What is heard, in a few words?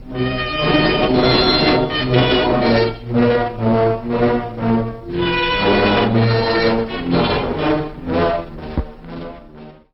Japanese Music; Mars Sortie